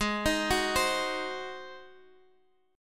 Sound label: G sharp 7th, Guitar, DADGAD; 18,18,16,17,x,x; down; chord shape